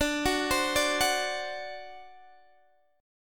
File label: D 7th, down; D7 arpeggiated